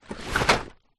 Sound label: household under bed storage box fabric movement